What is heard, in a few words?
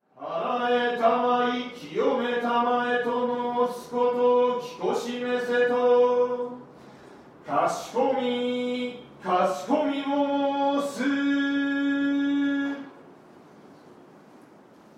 oharai